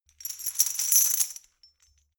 metal chain handle clean

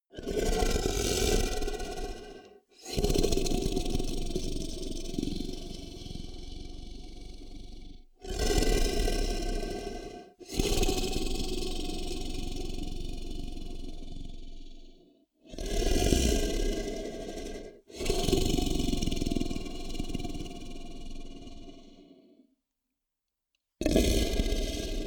monster snore vibrate; comedy-cartoon; koerpergeraeusche

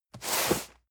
cardboard box medium slide hard; objekte

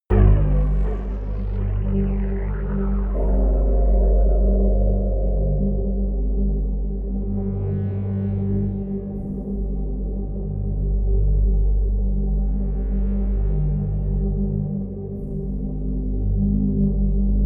water torture, music elements

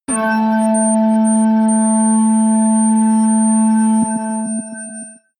metal organ high, hits/impacts

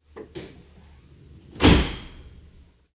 train door closing

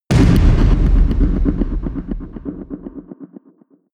low rhythmic; whooshes rises

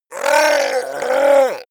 goblin scream painful; schreie

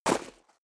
step high heels gravel medium single stair up; schritte; Footsteps; menschen

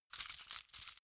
metal chain jerk soft

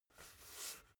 basketball spin turn in hands short; sport freizeit

